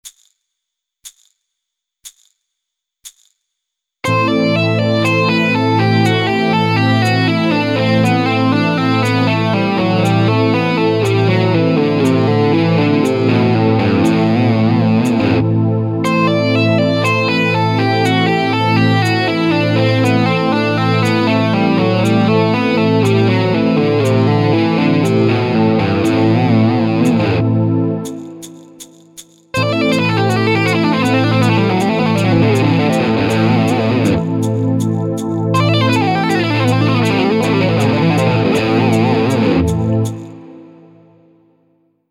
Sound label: LEGATO STYLE